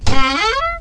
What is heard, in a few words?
door creak